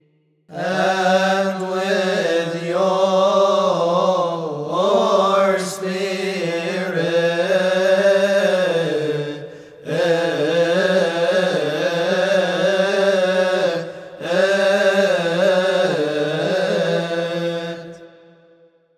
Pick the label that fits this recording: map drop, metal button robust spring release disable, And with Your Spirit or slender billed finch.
And with Your Spirit